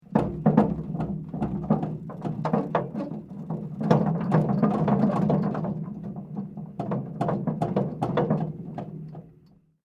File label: METAL CREAK SQUEAK; Sound Effects:Materials; Foundation3